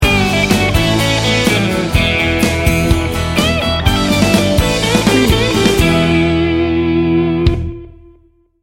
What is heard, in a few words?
american made sting bumper